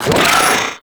Swarm Vocal, Enemies; Warframe; ManInTheWall; LimbVocals

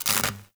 Worker Welder Stop; Warframe; Grineer

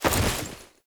Tenno Vault Up Generic, Foley; Warframe

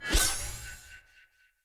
Energy Blade Warning; Weapons; Swords

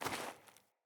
Sandals Walk Snow, Footsteps

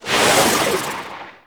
Pod Shotgun Proj By

